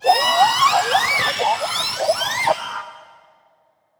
BABY MOLOCH alarm

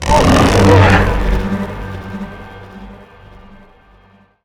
damage; Barotrauma; Jove

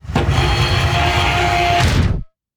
Crowbar; Tools

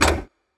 Duct; Door

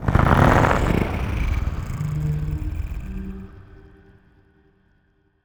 idle, Jove

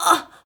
female damage; Human

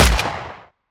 assault Rifle; Security; WEAPONS

